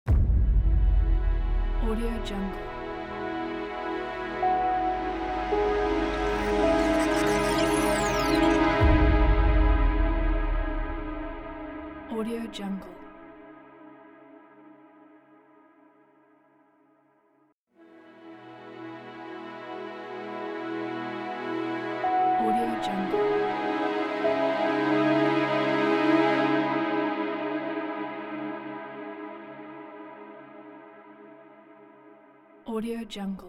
cinematic logo short

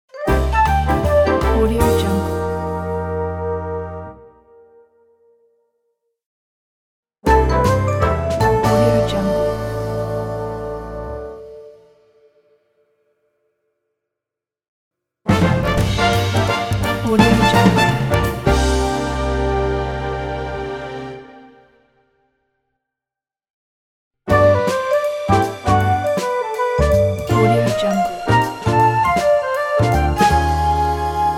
jazzy sitcom stinger transitions pack

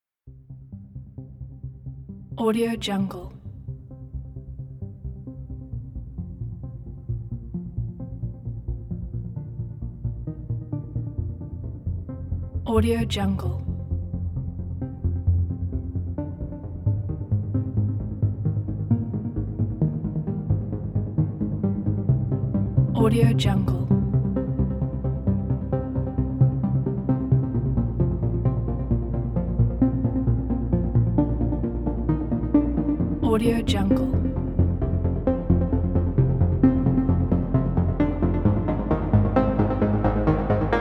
retro synthwave intro trailer

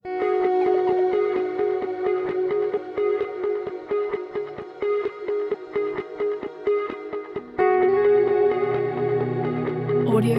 ambient calm cinematic guitar

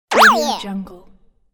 game laser hit yeah voice

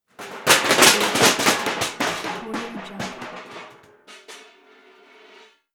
steel can avalanche

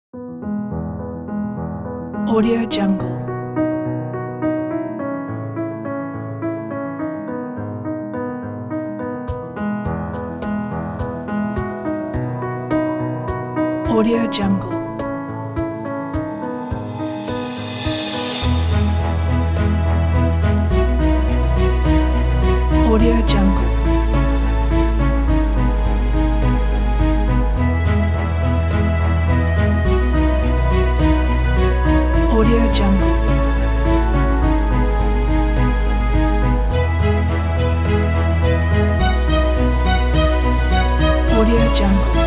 inspirational motivational piano and strings

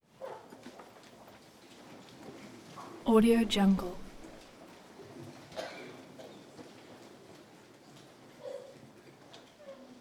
crowd 250 people in auditorium quiet

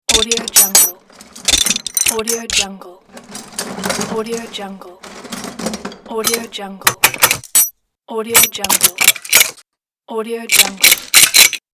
toolbox metallic noises sequence; boomopera-sfx

